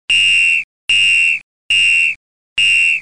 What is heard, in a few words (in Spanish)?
!POLICIA; Pipo-Aprende musica con Pipo